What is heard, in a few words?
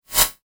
multimedia rollover, Multimedia Rollover Tone